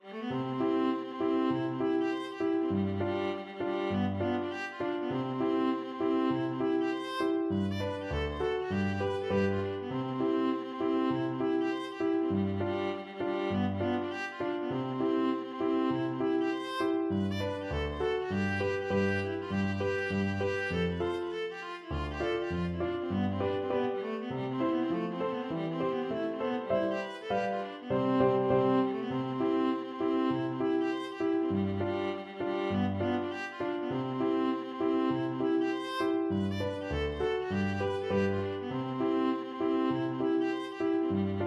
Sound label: trumpet hornpipe, viola